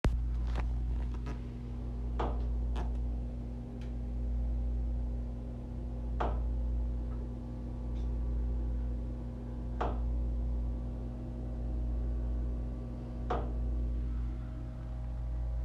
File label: Tap tap